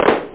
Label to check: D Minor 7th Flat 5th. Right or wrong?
Wrong